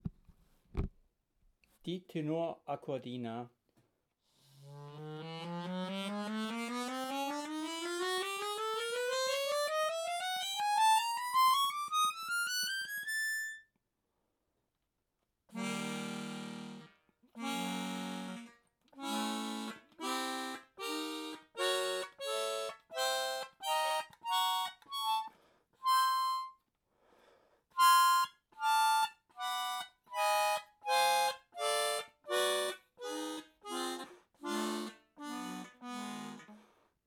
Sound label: acc tenor; BOREL